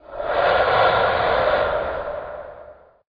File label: Ambient